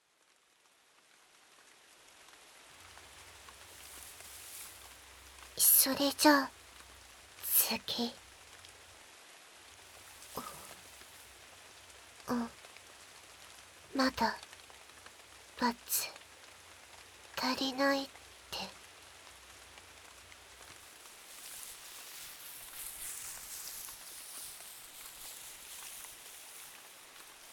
雨降り, 環境音; ASMR; 杜の神と傀儡少女; 環境音vol下げバージョン; 雨降りバージョン

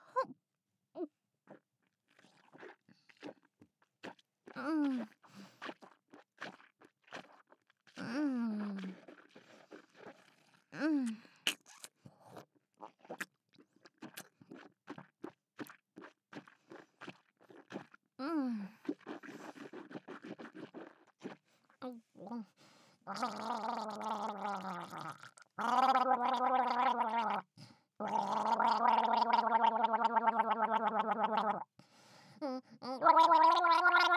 ザーメンぐちゅぐちゅうがいからのザーメンゲップ; ザーメンゲップ集; ASMR

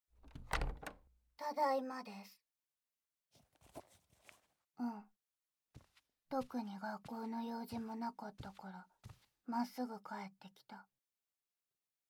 耳舐めご奉仕

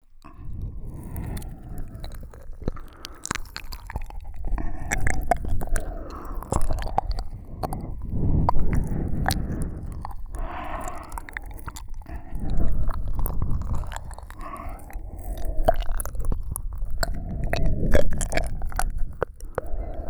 ASMR, まったりMIX左耳; 脳バグ

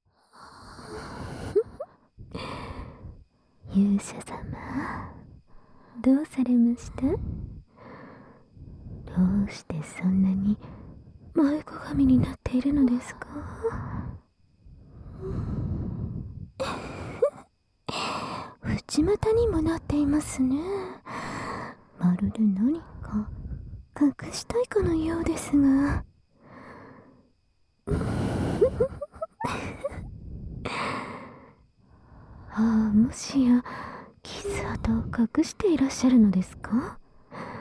両耳舐め手コキマッサージ; サキュバスの罠

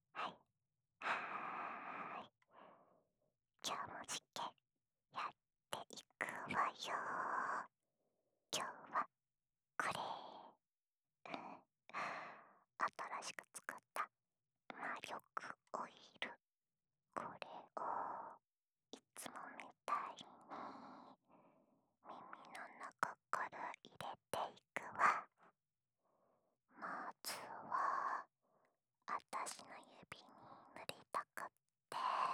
安眠用オイルマッサージ（両耳）, ASMR

